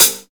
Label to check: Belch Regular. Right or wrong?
Wrong